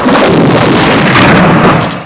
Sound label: explosion